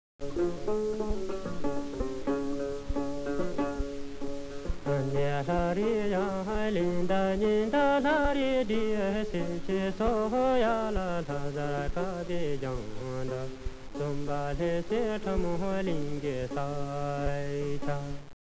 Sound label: singing; stampquest